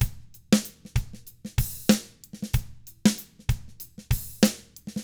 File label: 95 funk, drums; ILIO; Double Platinum Drums 1